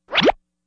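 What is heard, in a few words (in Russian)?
мультяшный поворот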